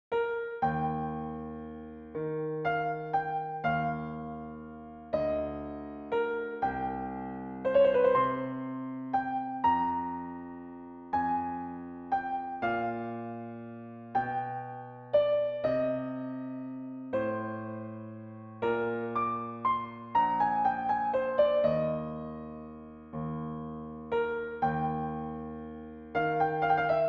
ノクターン

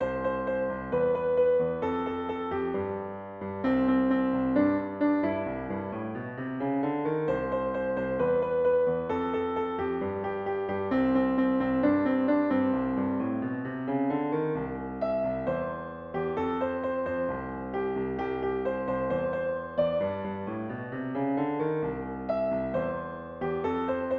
グリーングリーン（ピアノ）

